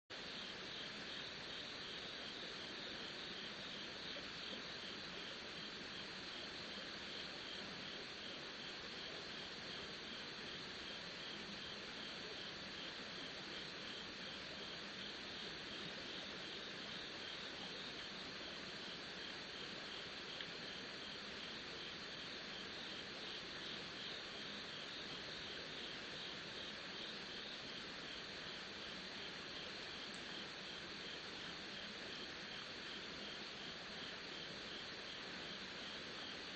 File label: riverside; Kyoto U; Ashiu